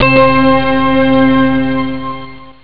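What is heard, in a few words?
bell string; jazz jackrabbit 1; M8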